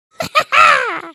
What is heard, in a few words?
Weapon Laugh